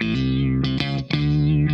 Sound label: SHOT, Funk Guitar; 90 s Sample CDs; Zero G